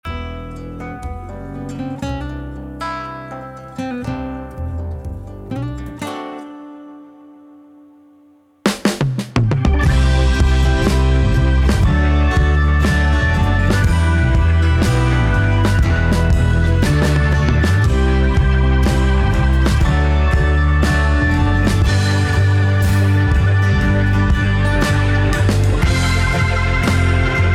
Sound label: 70s drum sound